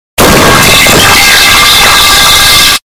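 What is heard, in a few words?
metal pipe bass boosted; Voicemod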